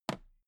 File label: toolbox handle bounce soft